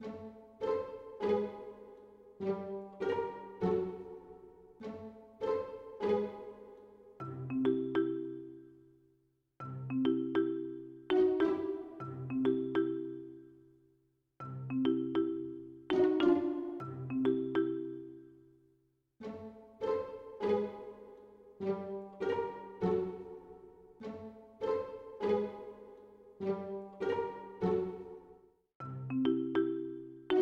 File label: Quest Melody Loop; bakugan champions of vestroia 2020; switch gamerip